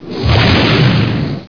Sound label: x wing by; yoda